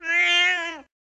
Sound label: Domestic cat meowing wanting attention